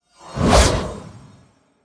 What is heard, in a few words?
1H Sword Magical Underlay Attack